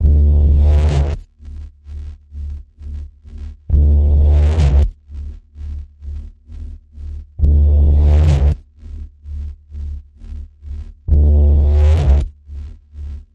Ominous Pulse D, Key of D

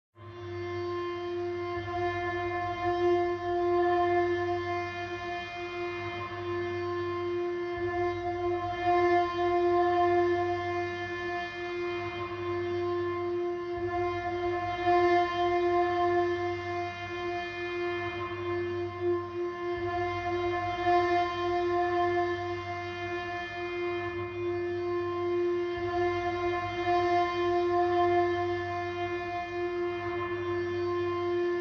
Strings and Choir

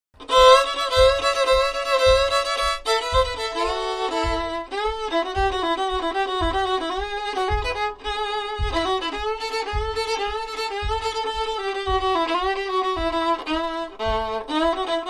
Two Step Solo Violin

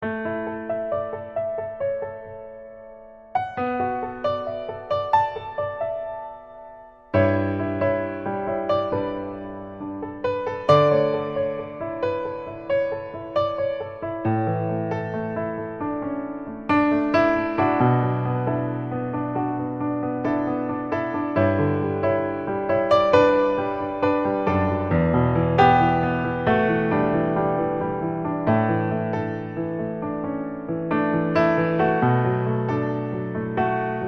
Delicate Piano Etude

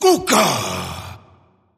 Vocal Fury Male Tribal Chant

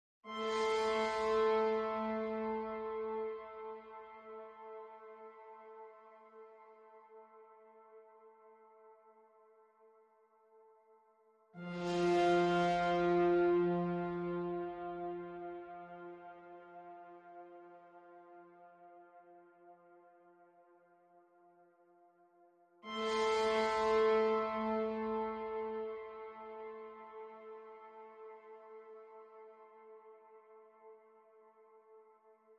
Tuba and Flute Only

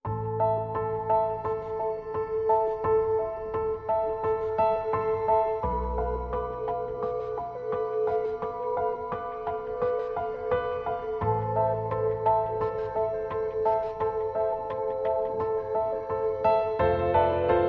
Piano

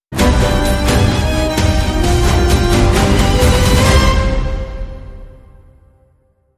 Tango Sting

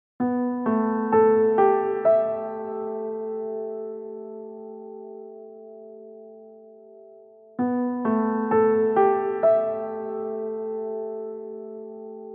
Piano Only

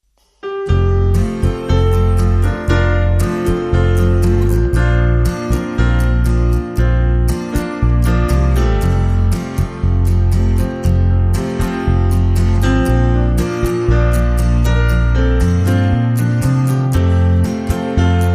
Easy, No Drums

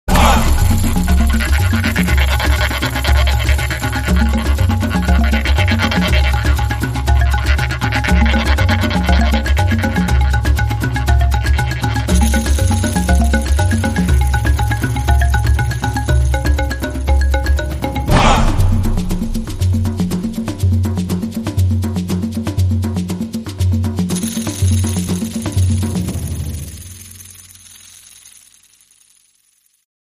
Balarimba

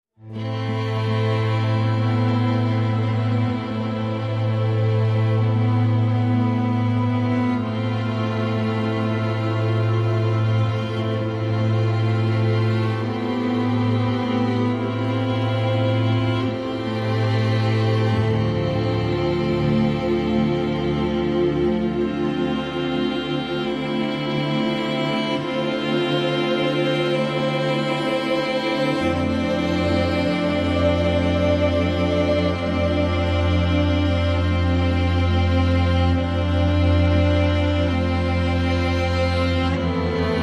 strings and pads

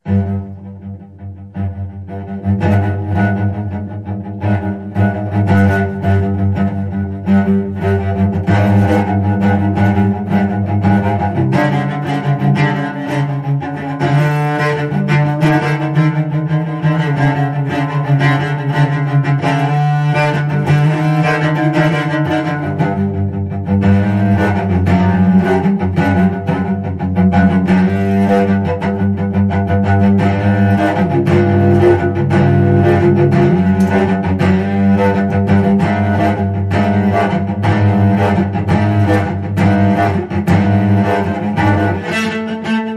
Cello Danger Action

